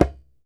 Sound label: DJEMBE, African Drum